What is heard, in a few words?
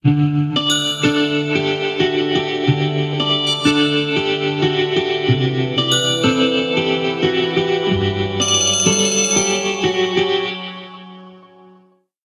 forest air, alarms; Sony Xperia M2 D2303